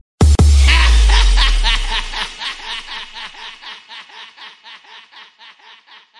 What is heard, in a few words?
Base Beat Laugh; DJ Effect